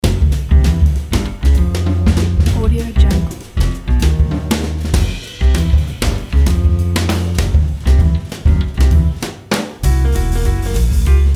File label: jazz